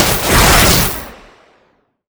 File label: storm loop end; weapons; dota; razor